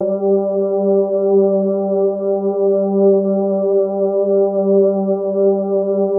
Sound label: GLAMA G2, SYNTH VOICES; Music Store - Claudius Diemeter Schwerlos